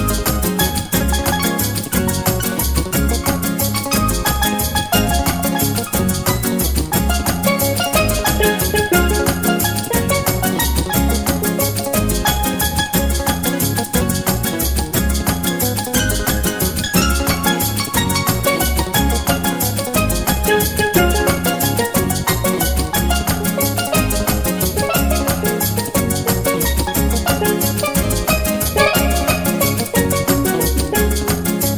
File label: Joropo